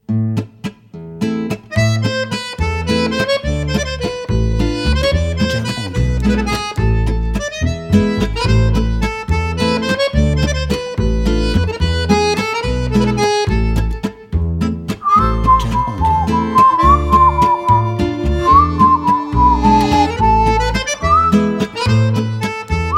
French Cafe Accordeon

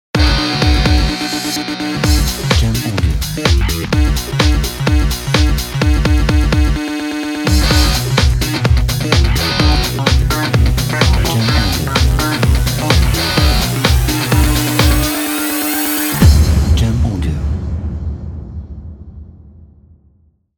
Rock Energetic Intro

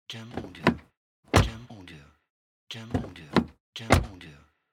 Car Door Open Close

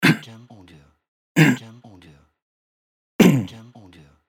Man Coughing

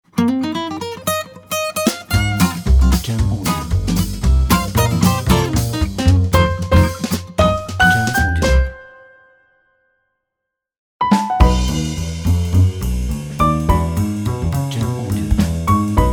Jazz Logo Ident; Jazz Logo Ident Pack

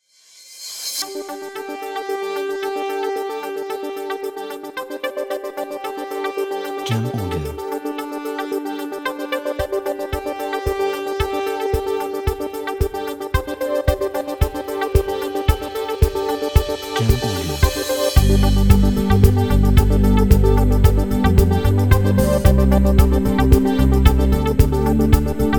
Uplifting Corporate

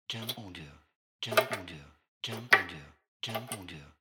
Tap Water Drops

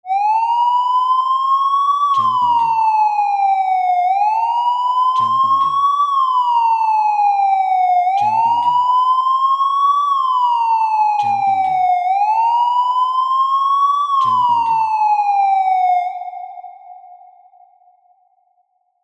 Ambulance Siren

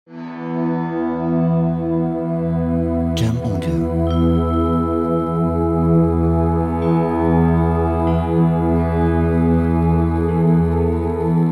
Tibetan Singing Bowls

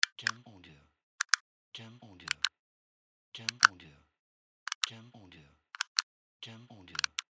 Switch

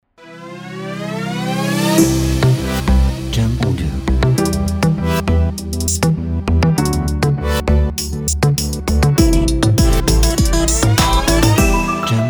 Future EDM Intro

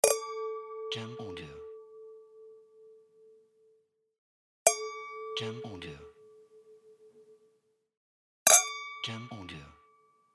Glass Clink

